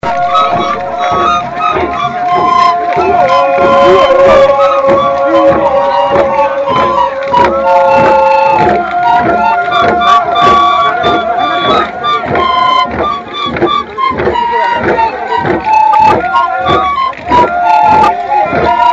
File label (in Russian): dudki